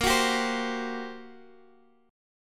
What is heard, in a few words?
G Minor Major 7th Double Flat 5th A sharp